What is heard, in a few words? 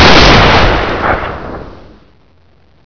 akm, weapons